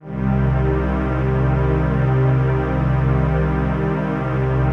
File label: TRANCPAD; Best Service; XX Large Pads; Sample CDs